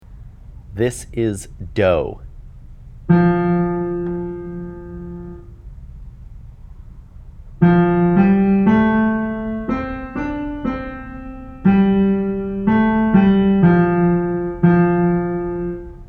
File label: music dictation examples